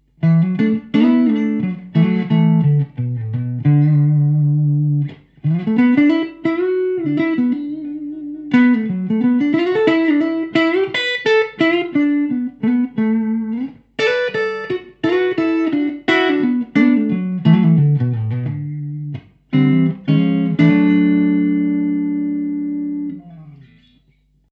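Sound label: clean neck, guitar pickups; schwarzbach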